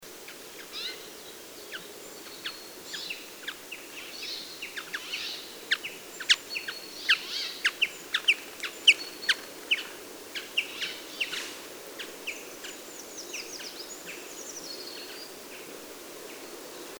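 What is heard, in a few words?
brambling, chaffinch coelebs, flight call; reudnitz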